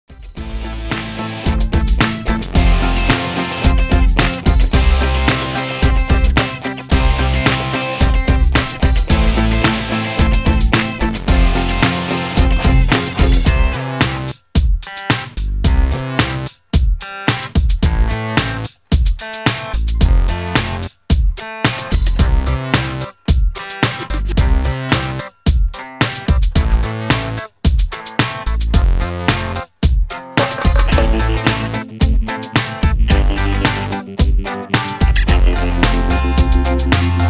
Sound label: instrumental